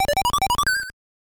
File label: Chance Machine Win; Kaettekita Mario Bros.; NES